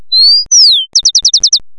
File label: synthetic bird